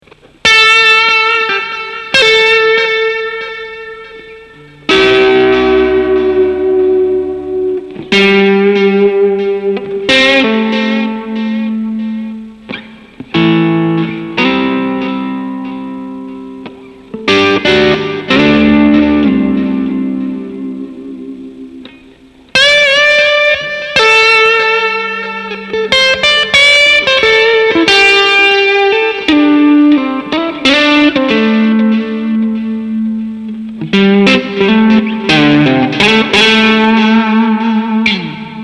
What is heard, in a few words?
UAD OX Amp Top Box